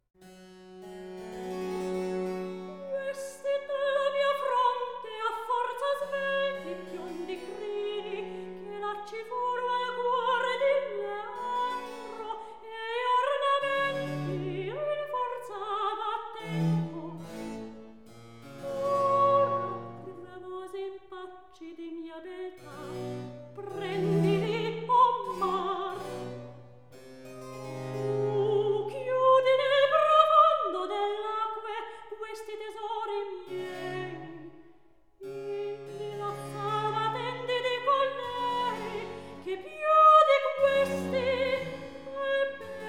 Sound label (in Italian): Recitativo; Ero e Leandro HWV 150; Questi dalla mia fronte; Handel Masterworks; Klassika